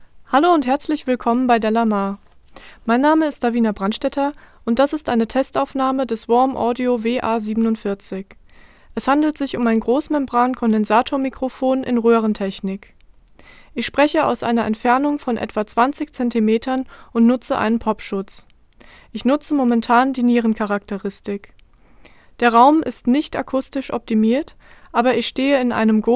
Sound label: vocal; warm audio wa-47